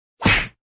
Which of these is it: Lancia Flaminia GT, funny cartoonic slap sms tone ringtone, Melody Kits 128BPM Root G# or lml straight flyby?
funny cartoonic slap sms tone ringtone